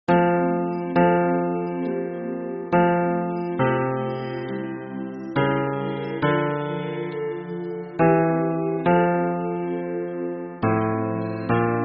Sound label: hymn bass